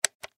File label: Button Switch; Multimedia Web Interactive; Buttons (Sound Effects:Multimedia; Blastwave; Technology